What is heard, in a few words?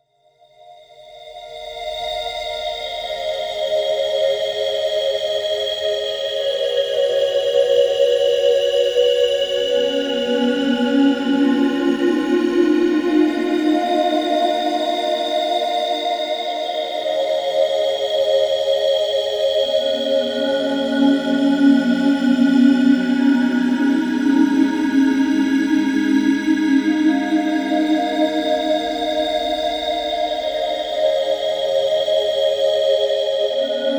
Cryptic Crystal, Drum n Bass, Pads; KJ Sawka; Drum n Bass & Beyond